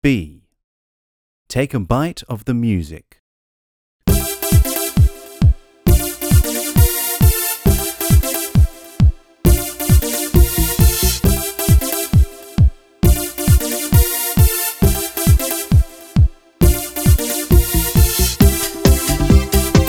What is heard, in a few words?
Rhythm, exam music